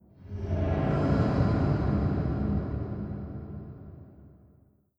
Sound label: vox wet, strider, combat, idle; creatures